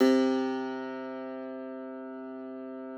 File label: Thumb Tack Piano C1; ff -ped; Total Piano